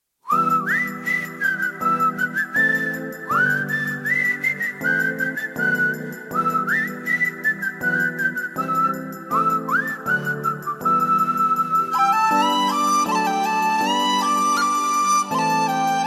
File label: Tamil Fulute